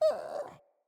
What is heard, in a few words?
Puppy Idle Coo; Enemies; Vocals; Kubrow